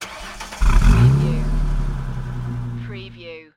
Mustang 68 Fastback Start Up Exhaust Perspective

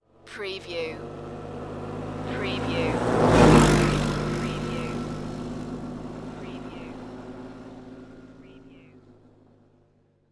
Quad Bike Drive By Center

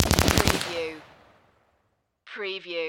Machine Gun Colt AR-15 Short Burst Close Range

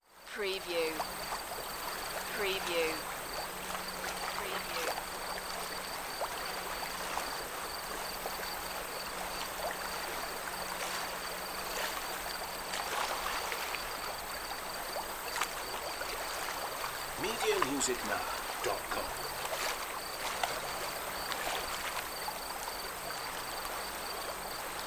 Harbor Ambience, Marina, Waves, Insects, Rural

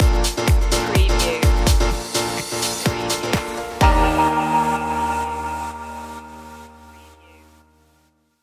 summer clubbin stinger

